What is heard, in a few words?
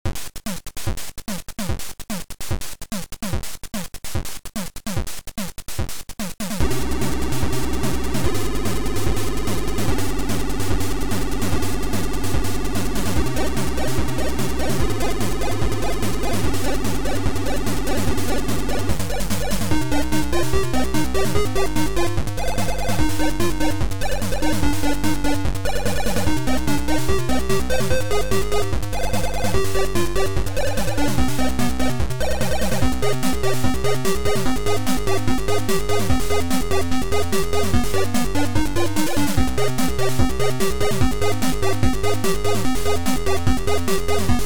BIP TUNE